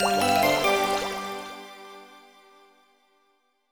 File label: Diving Jingle; Strawberry Shortcake The Four Season Cake